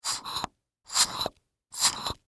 Toy Medical Blood, Toy Medical Blood Pressure Pump Squeeze Multiple; Foley; Miscellaneous Cartoon; Blastwave